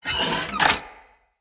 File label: Light Mirror Move A; Puzzles